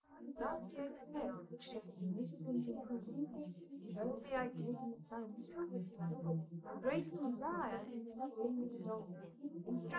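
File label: female, live recording 250ms 5cm; SiSEC11; underdetermined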